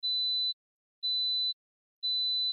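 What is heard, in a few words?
puretone 4000 Hz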